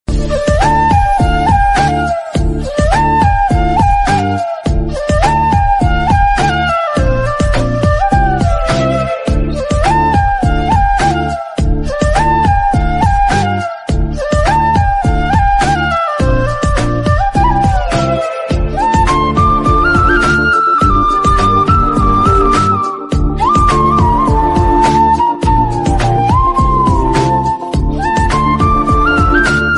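flute background music ringtones love ringtones telugu ringtones; telugu bgms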